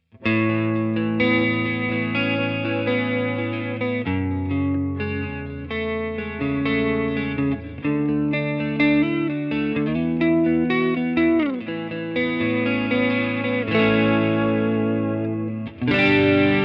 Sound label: Quad Cortex Clean Advanced Quad